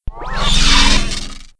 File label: Hammer God Pulse; Playable Tutorial Level